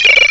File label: Malfunction; SFX; Sound Library 2000; Wayzata Technology